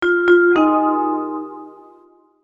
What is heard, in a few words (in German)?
bahnhofjingle; ffs